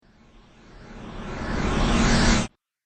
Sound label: Sci Motion Flyby, whoosh sound effect; Andrew Fleming